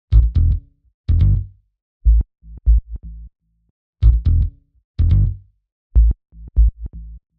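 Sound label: 123BPM SPARSE BASS A minor, Bass Loops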